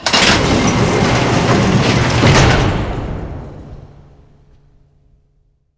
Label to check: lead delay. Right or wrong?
Wrong